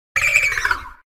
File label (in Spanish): Grito de Wishiwashi forma individual